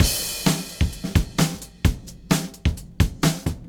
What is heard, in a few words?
Drums